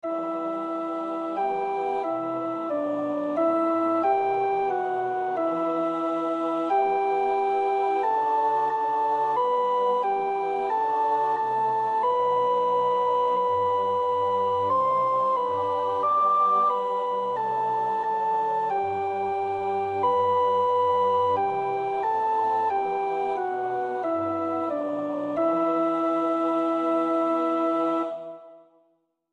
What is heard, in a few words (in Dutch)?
sopraan; Nieuw Liedboek; LB 721 Houd ons bijeen, God, rond uw woord